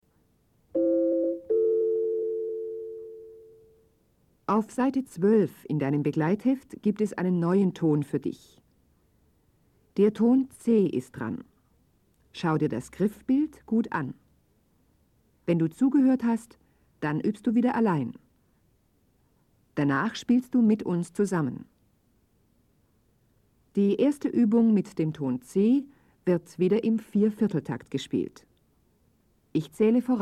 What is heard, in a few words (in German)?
Spiel Blockfloete